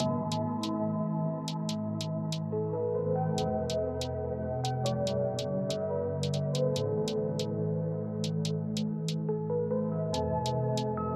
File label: drill; NY Drill; UK Drill; FR Drill